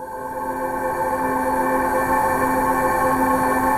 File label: THROATY; Pro-Rec - Synthsonic; JV FILM